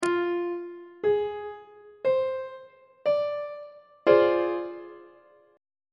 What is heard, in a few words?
c double sharp half diminished 7th 1st inversion on treble clef